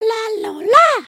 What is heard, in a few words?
train sit, emotes